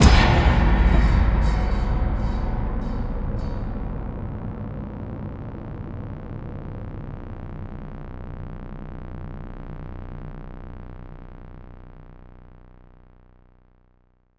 STING drone tone wang; Cinematic Metal Impacts

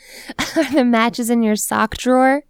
Dresser; blindside

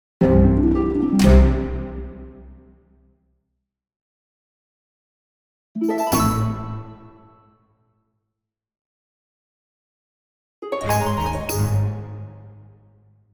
Orchestral Jingle Positive

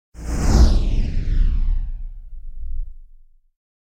Whoosh Firing Phased; Hollywood Edge; sound designer's toolkit v2

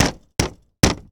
Plane P40 Hit Bang Canopy; Airplanes

